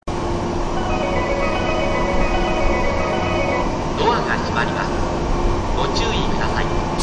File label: melody, station